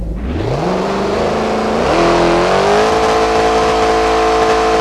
first, vehicles; hellcat

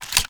reload start load; gmod

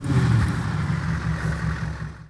throttle off, vehicles; courier truck

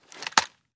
reload end; gmod; viper; weapons

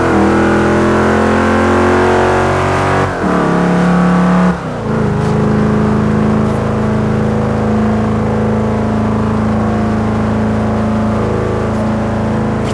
fourth cruise, vehicles; gmod; tdmcars; mer e63

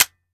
fire first; viper

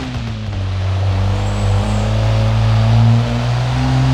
fourth cruise, vehicles; vswat

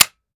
fire first; gmod; viper

